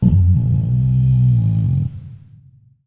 Stomach Deep Growl; battle suit aces

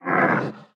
Damage; Folk Hero

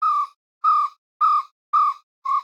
Amb Env Bird-Coo; viewfinder

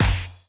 Bop it feedback

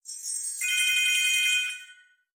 Jewelry Gain

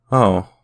Sad chorus

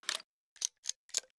reload start

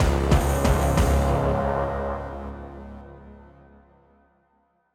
Battle End; Castle

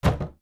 House Damage

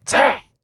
Attack; Grimm

